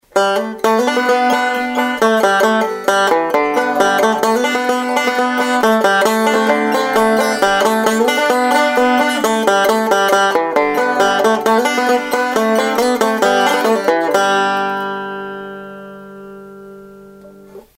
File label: banjo